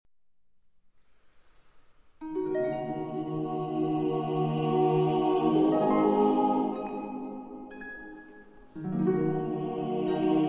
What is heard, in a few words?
Choir